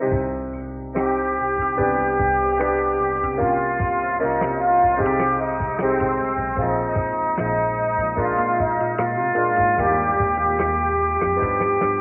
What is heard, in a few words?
horns, Sad